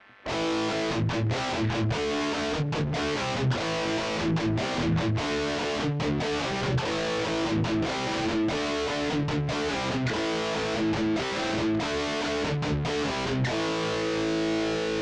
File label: sansamp british higgain clenter